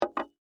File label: Shot Glass Down, Set; Foley; Party Sound Effects; Party (Sound Effects:Bars); BlastWave